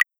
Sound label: click